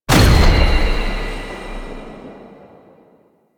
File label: Unhallowed Impact; Warframe; Warframes; Dagath